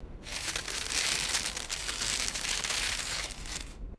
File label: dry crunchy leaves rustling; vegetation